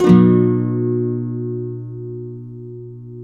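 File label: nylon guitar up major C, flam chords; Spectrasonics; Hans Zimmer Guitars VOL-2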